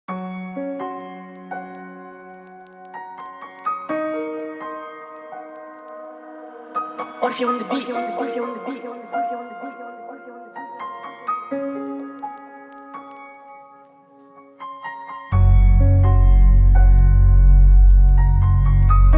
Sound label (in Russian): minusovka instrumental